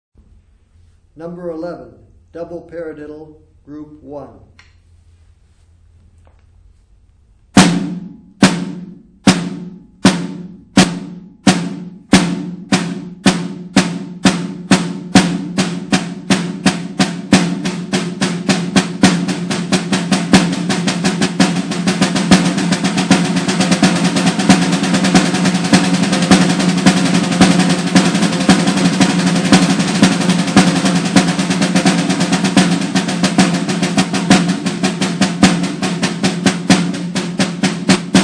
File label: snare rudiment